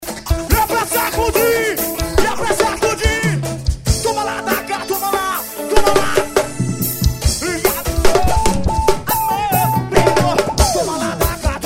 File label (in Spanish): swing play